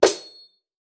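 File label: Barbarian baby, 部署音效; 野蛮人; 绿野世界; 部落冲突; 皇室战争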